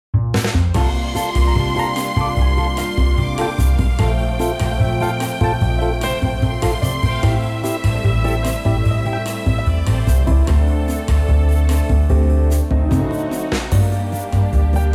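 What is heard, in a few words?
female, backing track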